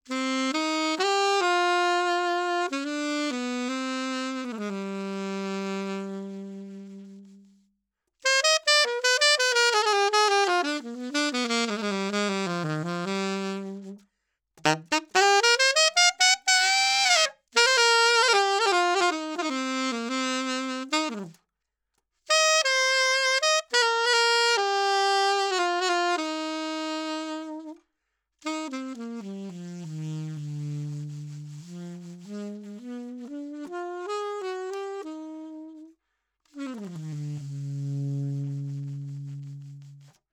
Sax Alto 20cm Low Mid Bell

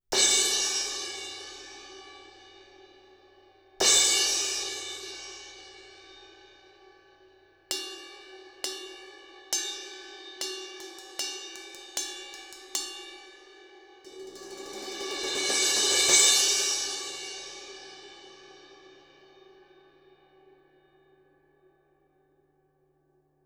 Cymb Crash; Close Edge

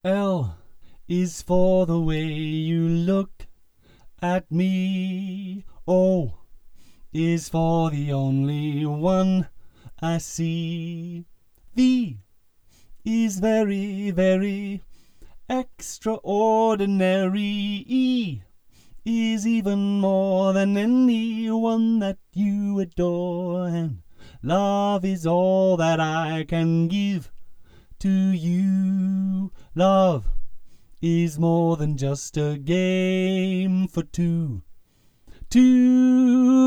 Spots Off Axis Coloration 135deg Card

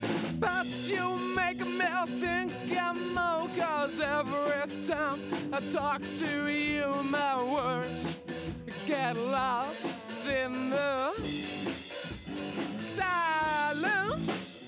Solo Vocal; Recording Mistakes

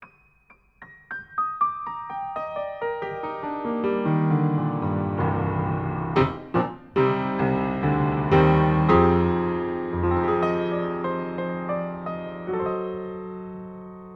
Piano, Front To Back Mic

